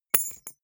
key ring small drop carpet

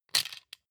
plastic frame small hit rattle

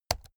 keyboard laptop key press slow hard

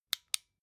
flashlight on

